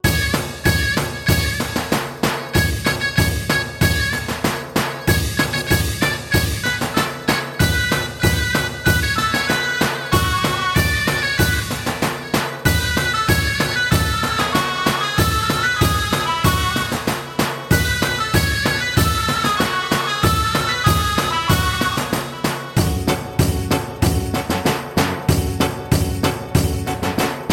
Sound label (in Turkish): mehter marsi